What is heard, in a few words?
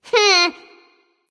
hehheh; smite; voicepack; jingwei